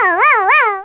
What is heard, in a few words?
Game Ringtone